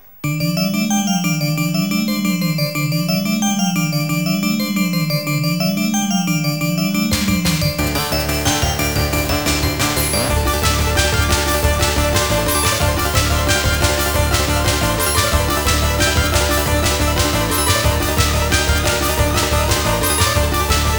Final Boss

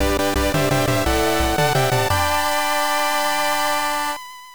All Round Clear; Side Arms Hyper Dyne; TurboGrafx-16

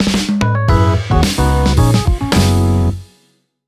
Music Menu event; eBaseball Professional Yakyuu Spirits 2021 Grand Slam

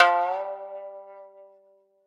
shamisen; B Path of the Teal Lotus

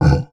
MONSTER Grunt Short mono; Infernal Radiation

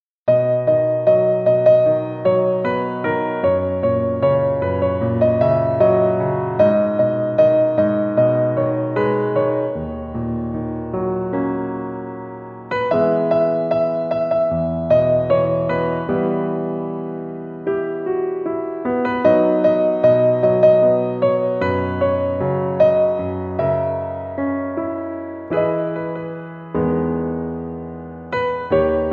Piano Solo; Supreme Candy Soundtrack CD 2009; Door to the Future

